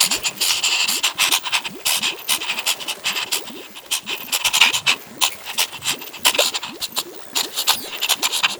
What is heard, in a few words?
despair loop; LoL; badrobotamumu